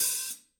HiHat; Drums & Percussion; One Shots Drums

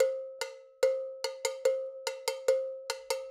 Bell, acoustic percussion loop; Drums & Percussion; Percussion Loops

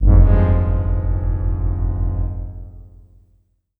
Synth Bass

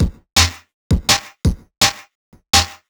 Breakbeat, Drums & Percussion

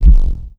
Synth Bass

